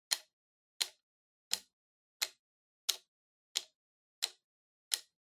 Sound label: Soroban Upper Plus